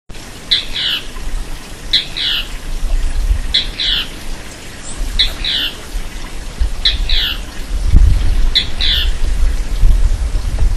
Scytalopus superciliaris; Portal de Piedra; Jorge La Grotteria

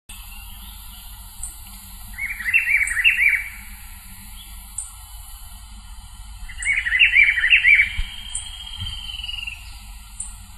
Cyclarhis gujanensis; PP Cruce Caballero; Jorge La Grotteria

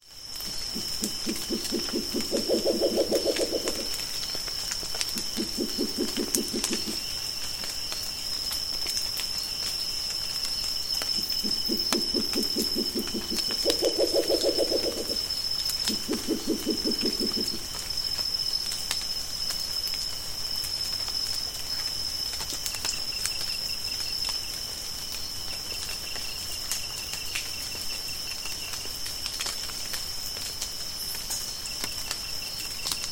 Pulsatrix perspicillata, Murucututu

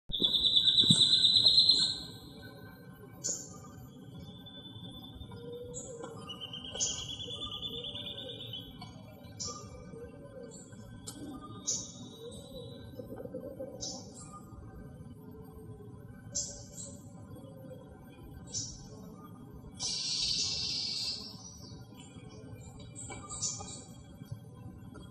Conopophaga lineata; Karadya; Jorge La Grotteria

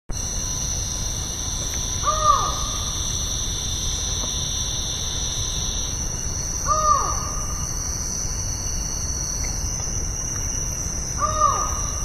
Micrastur semitorquatus; Ruta 101; Jorge La Grotteria

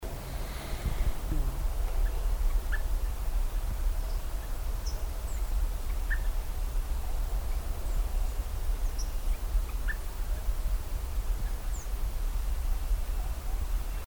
Muscipipra vetula; Casa de Piedra; camino al planalto; PN Itatiaia; Rio de Janeiro; Brasil

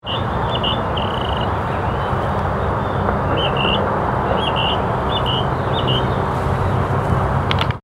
Pardirallus sanguinolentus

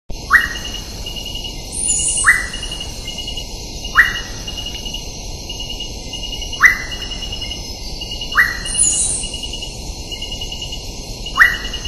Chamaeza campanisona alarm; Refugio Mocona

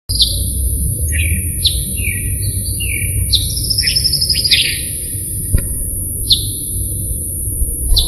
Elaenia mesoleuca; PP Araucaria; Jorge La Grotteria

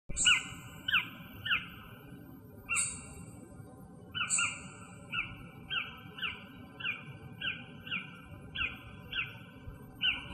Dysithamnus mentalis; Karadya; Jorge La Grotteria

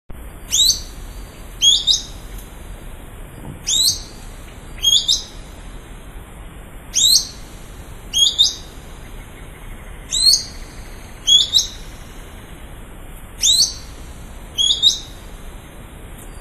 Synallaxis scutata; PN Calilegua

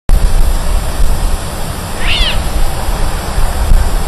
Phrygilus fruticeti; Humahuaca

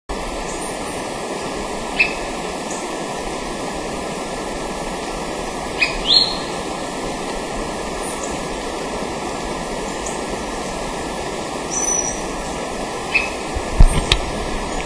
Myiarchus tyrannulus; PN Calilegua

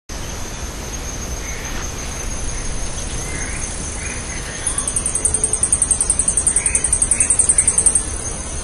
Crypturellus tataupa; Los Picazos; Jorge La Grotteria

